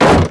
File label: hit skel